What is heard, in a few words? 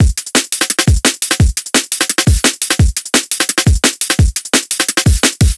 breakbeat dry; wavefield